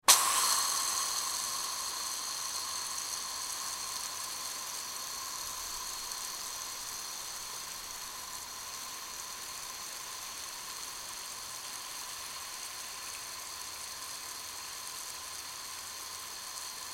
Water Spray Garden Hose Shower; Garden Hose Gun; Shower Setting; Watery; Spraying; Big Room Sound

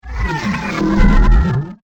Alien Indigestion; Alien Sound Effects; science fiction sound effect; star wars / trek style games; Andrew Fleming

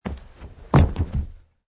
Landing; Man wearing jeans and hiking shoes jumping and landing on carpet surface - variation 22; Wrestling and Struggling; Michael Huang

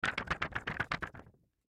Pamphlet Shake; Paper; Office; Blastwave

